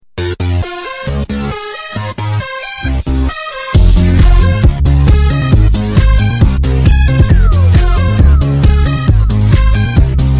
Techno Beat; It Crowd

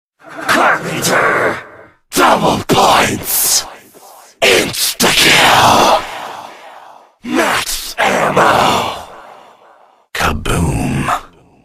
Power Ups All

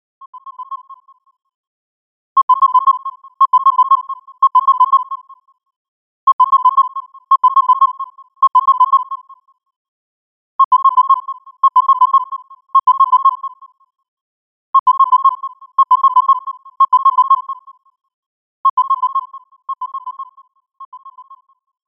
Signal Sound

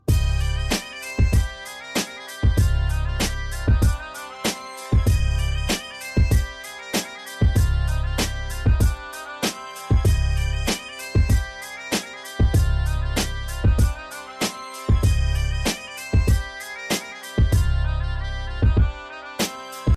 Bagpipes; Baghdad

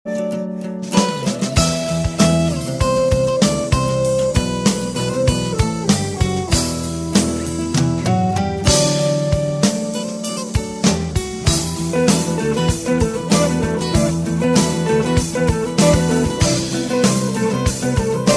Guitar Tone

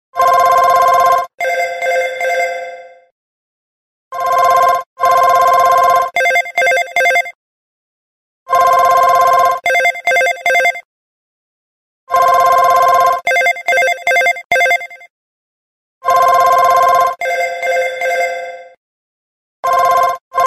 Business Ring; Htc

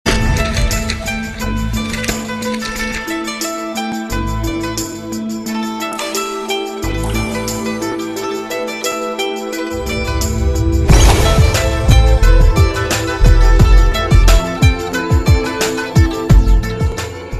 Cool Music

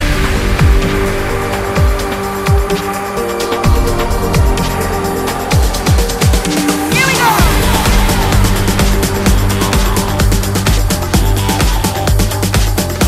Hard Rock

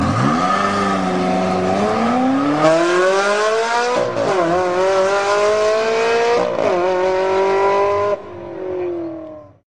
Ferrari 360 Cs

